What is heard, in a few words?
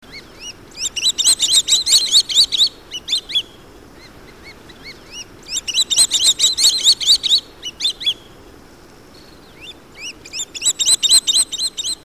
Swallow tailed Kite, songs